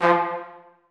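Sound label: trumpets staccato e3; Sonatina Symphonic Orchestra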